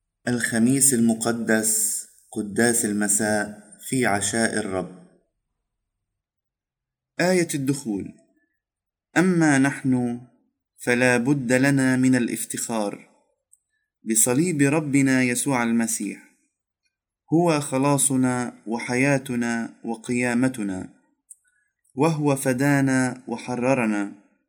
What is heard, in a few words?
Entrance Antiphon; Holy Thrusday